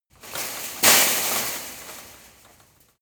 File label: land metal fence